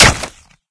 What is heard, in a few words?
headshot; player; Source Engine; cstrike